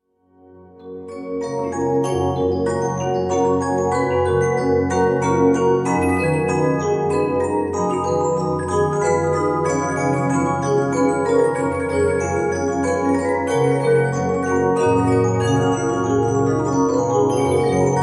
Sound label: Piano Concerto, music box